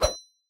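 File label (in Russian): металлический свист замаха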